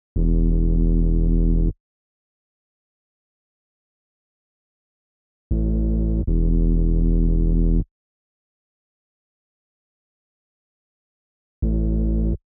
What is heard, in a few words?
157 BPM E Min Bass; Melody; Reverse; Loop Stems; Cymatics; 2022 Melody Collection - Reverse; Purple and Gold